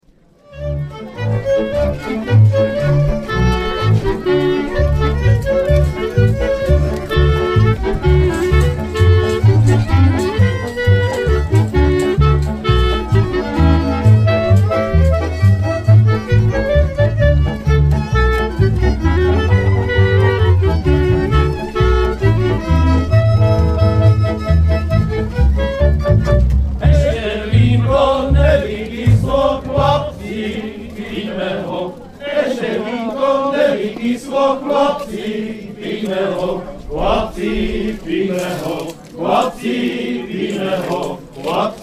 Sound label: Verbunk